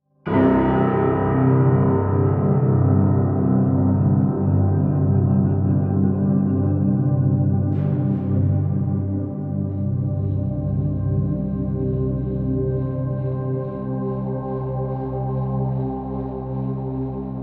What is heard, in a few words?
Facility Stinger With Cello; Valley